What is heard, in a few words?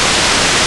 hat noise 32k